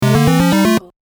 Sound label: 8bit level end